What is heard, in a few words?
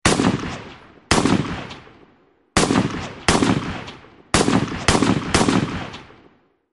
Gun Alert